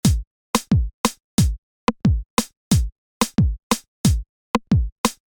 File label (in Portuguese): Pattern de Kizomba